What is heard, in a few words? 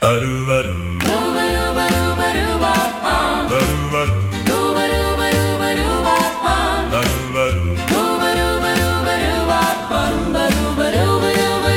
Everyone singing Carols celebrating Christmas; hilarious singalong christmas party fun